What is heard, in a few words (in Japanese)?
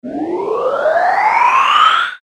上昇